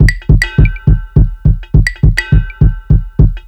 Drums Intro, Cinematic Funk Urban; Sonic Foundry (Sony Creative Software); Cinematic Funk Urban Tone Poems

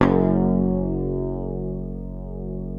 PICKED, Bass, F#1; Sampleheads; Will Lee Bass Library

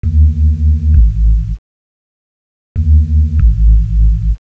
Hard Jungle Bass; Hip-Hop; Fast Breaks

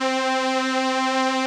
SOLINA, String Machines

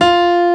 piano F3; Analogon

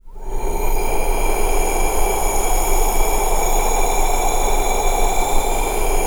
NOISEBED, VOCAL WIND; ILIO; Vocal Planet; Jazz & FX

